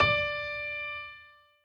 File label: piano echo